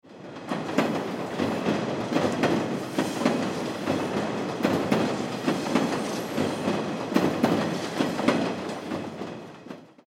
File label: train